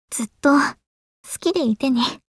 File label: applause